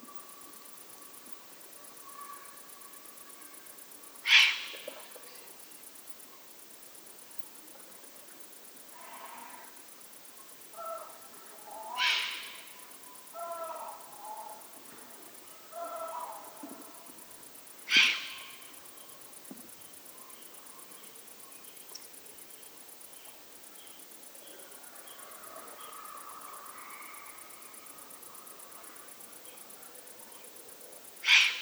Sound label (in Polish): puchacz mlodociany